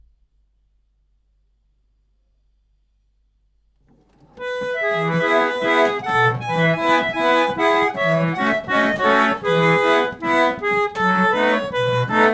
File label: organette, verkli; 1904; lipcse